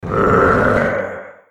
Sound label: growl; shaoxiadiablo